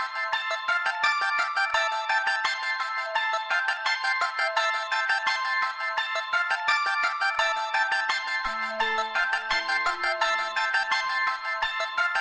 New Punjabi Latest Music Ringtone

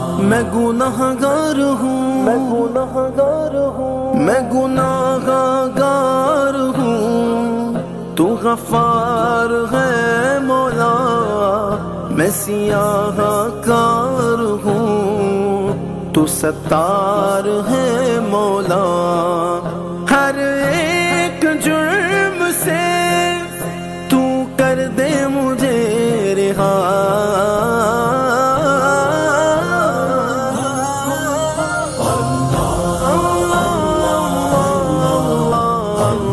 Islamic Ringtones

